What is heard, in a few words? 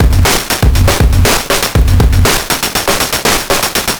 Noise Beat; Drums & Drones